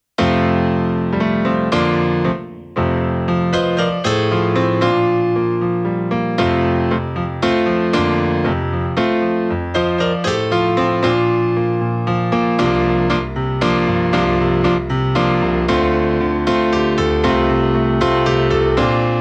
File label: vr-09 grand piano